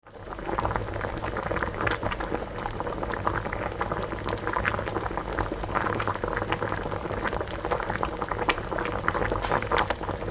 Boiling Water - liquid boiling in pan - simmering on stove; Kitchen; Household; Tom Hutchings